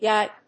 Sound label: yipe